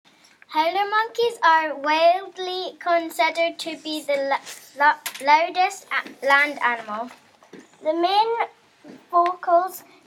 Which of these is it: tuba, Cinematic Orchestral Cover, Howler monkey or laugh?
Howler monkey